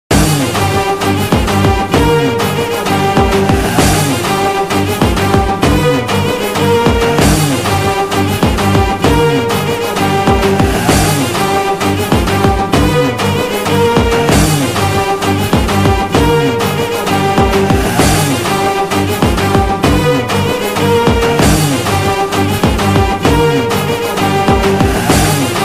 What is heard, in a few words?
BGM Ringtone; Viswasam